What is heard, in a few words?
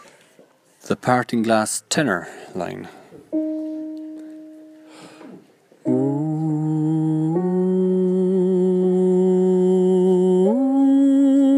Tenor; The Parting Glass